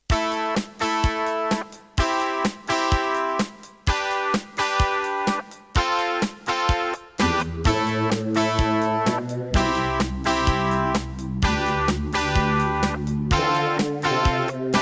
Roland PMA-5; raptor call of the shadows

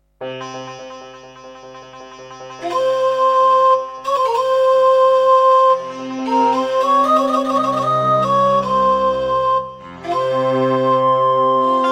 Intro, Roland SC-8820 88MAP; Prince of Persia 2 - Intro

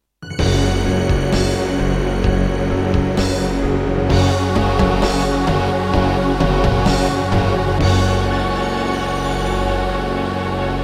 Introduction, Gravis UltraSound 1MB Rev.3.4; Doom (Sigil)

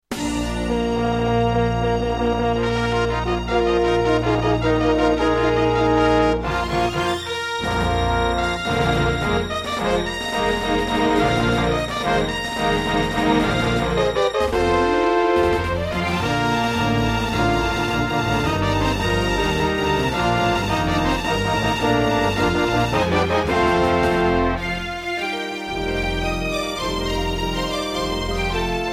Intro, Korg NS5R; Dark Forces

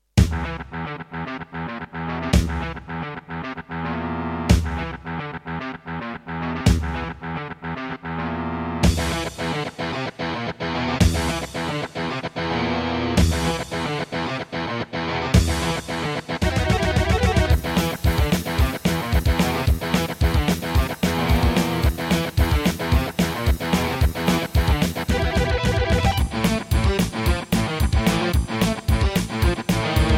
Roland SC-88 Pro; Doom

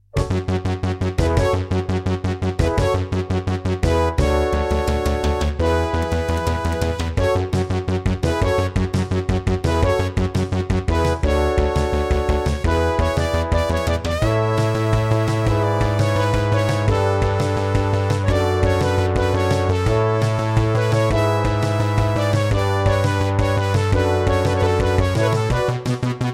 Intro, Yamaha DB50XG MT32 Mode; 4D Sports Boxing

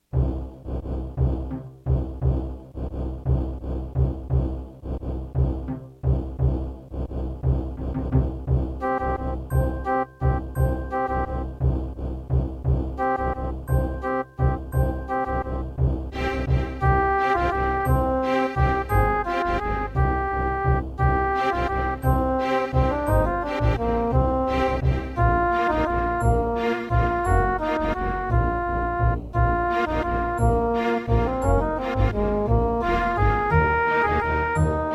Terratec MiniWaveSystem SOWT-11

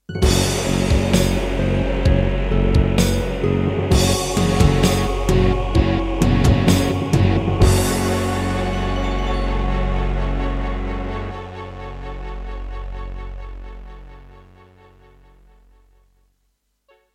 Introduction, Roland SC-88 Pro; Doom (Sigil)

